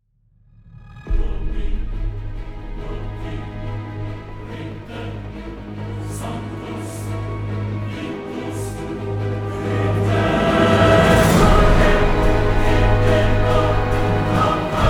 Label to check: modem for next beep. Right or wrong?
Wrong